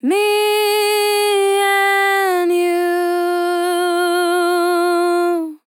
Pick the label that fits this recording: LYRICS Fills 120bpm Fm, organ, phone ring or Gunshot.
LYRICS Fills 120bpm Fm